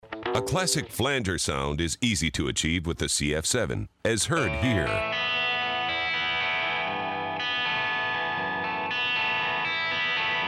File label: Classic Flanger